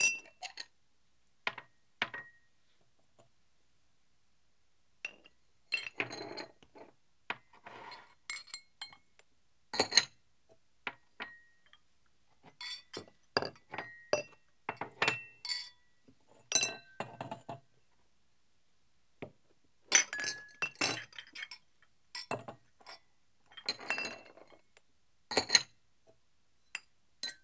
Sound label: Glass Clinks And Motion; Home & Office; 1001 Sound Effects